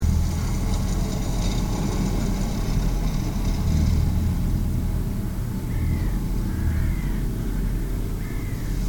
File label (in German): lachmöwe